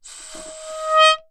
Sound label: Melodica Short Note